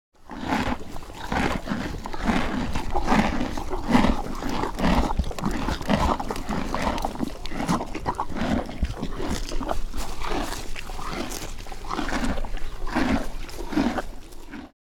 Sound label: Donkeys munching straw